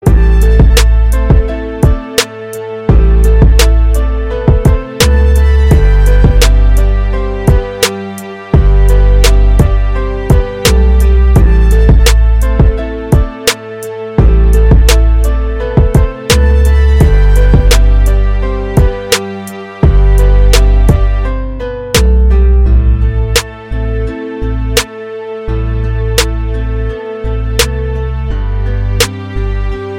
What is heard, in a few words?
Trap Beat